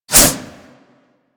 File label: Boomerang Whoosh; Warframe; Weapons